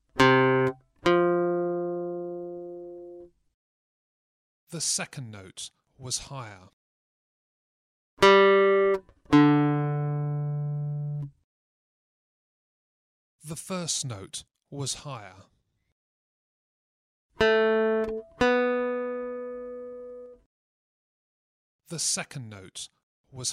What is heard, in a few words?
Aural Pitch Test, acoustic guitar